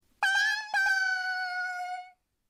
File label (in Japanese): ドアのチャイム